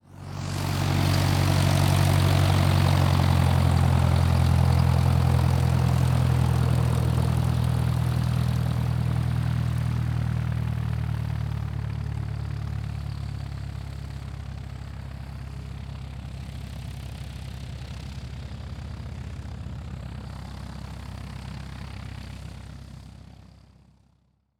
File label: Plane Taxi By; transportation